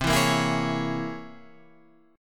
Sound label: C 7th Sharp 5th Flat 9th C